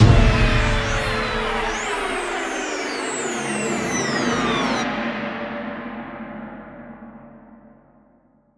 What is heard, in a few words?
Music Stings; Tv station; LEGO Movie Videogame